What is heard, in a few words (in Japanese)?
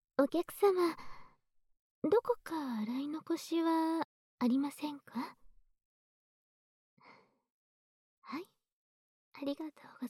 全身マッサージ フェラ, ASMR